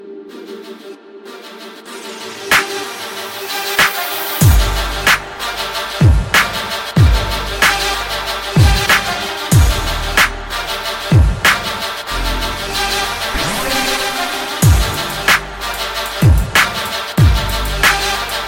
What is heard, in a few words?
beat